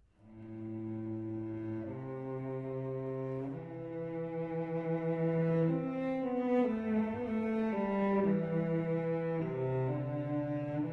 String Quartet in E Minor, Berceuse. Andante Molto Tranquillo; String Quartet No. 0 in E Minor, Op. 83 III.